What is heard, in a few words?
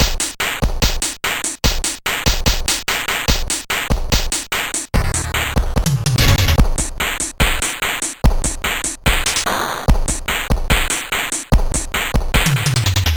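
biing bong drum; Soundcontrol 3.0-5.0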